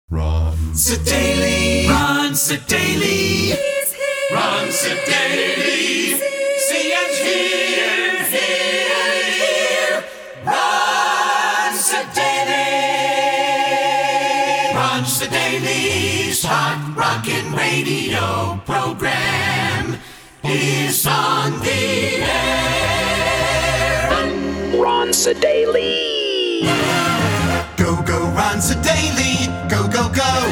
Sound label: Jingle Sampler; Rockin' Ron Sedaille; PAMS, JAM & TM